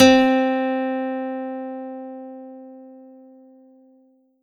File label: Roland SC-88 Nylon String Guitar C4